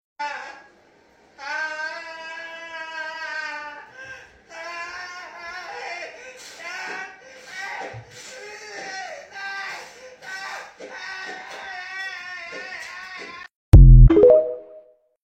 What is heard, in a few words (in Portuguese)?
mahito gritando